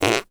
fart

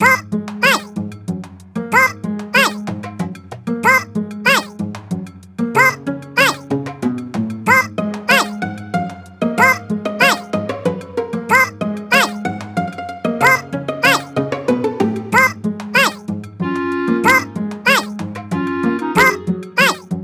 Bingo Battle, Nature, Chant; Pikmin 3 Deluxe; Koppaitte Chant

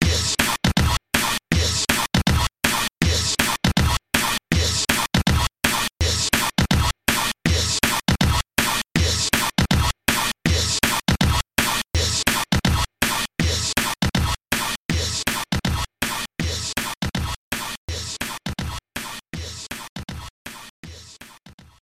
sea battle middle boss

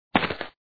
drop; gamerip; Gun Mayhem

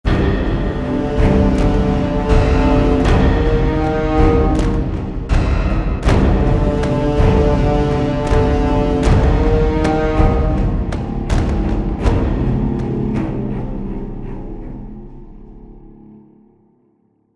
Music stinger

